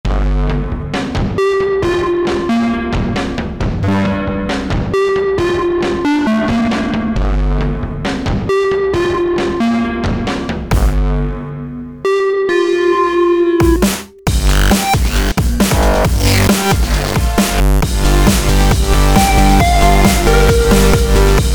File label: 140 breakbeat